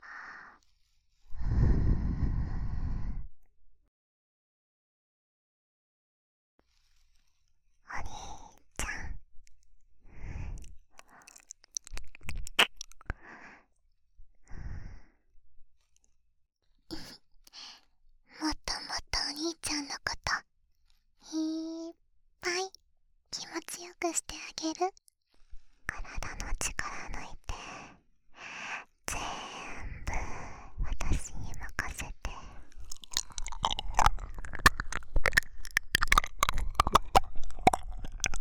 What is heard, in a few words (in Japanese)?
お兄ちゃんの全部が大好きな妹の耳舐め, ASMR; 【3大特典×耳舐め】妹の妹によるお兄ちゃんのための耳舐め